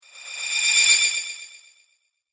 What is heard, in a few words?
Invisibility Cloak, magic sound effect; Rpg - Invisibility Cloak; fantasy sound effect; role playing games, magic videogames